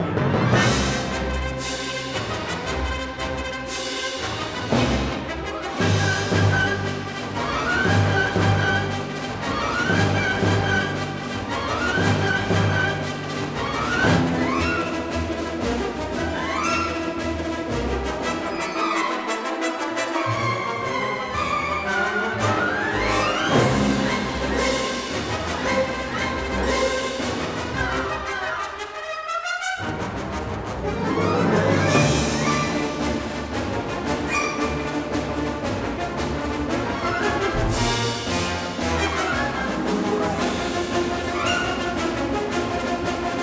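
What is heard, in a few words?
battle loop, music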